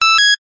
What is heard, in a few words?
technology electronic device beep tone; zapsplat